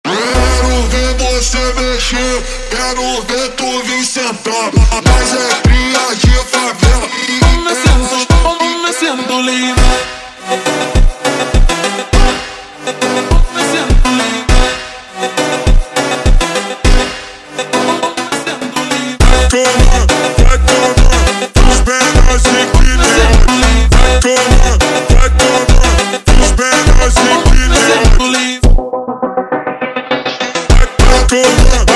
Funk super Slowed

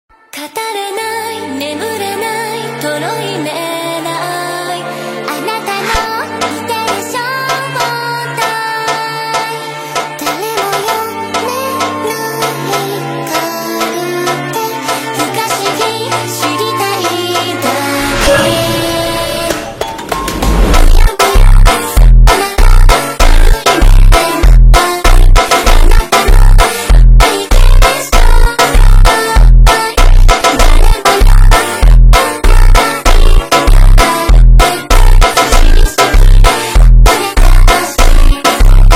FUNK Ultra Slowed

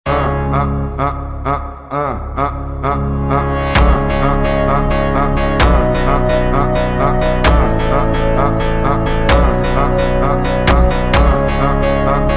Phonk

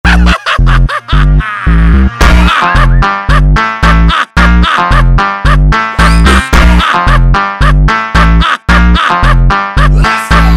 Meme Funk super Slowed

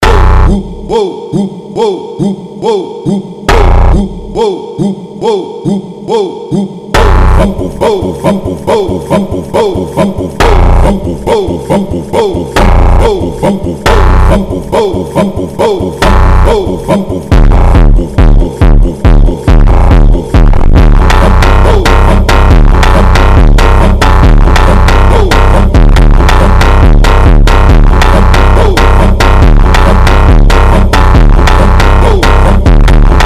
Phonk

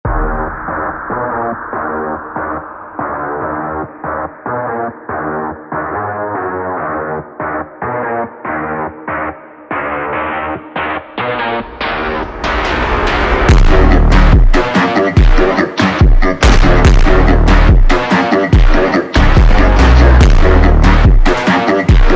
super Slowed

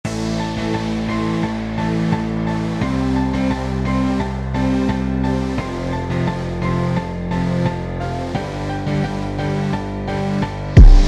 Funk ultra Slowed

